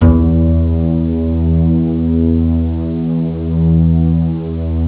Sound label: pizz; jazz jackrabbit 2; M8